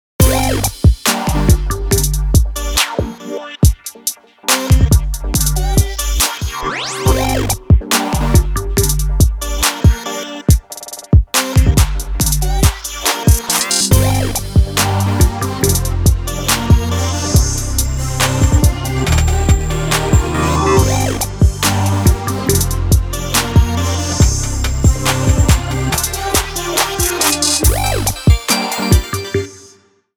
DIGITAL GLITCH TRAP

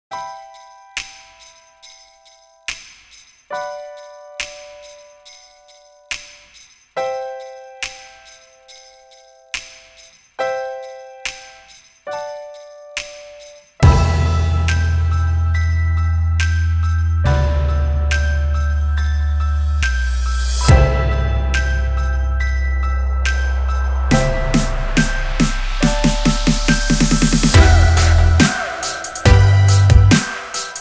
ONE HORSE OPEN BASS DROP INSTRUMENTAL

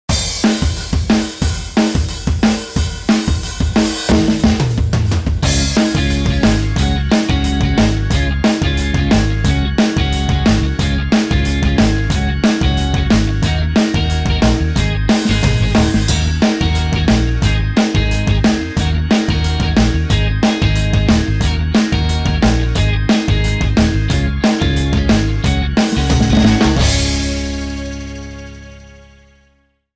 Instrumental

